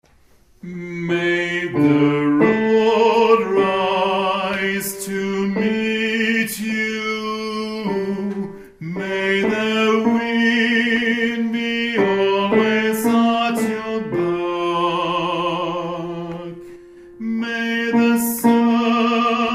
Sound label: Tenors